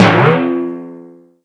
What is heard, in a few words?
bounce; Spanish; hot potato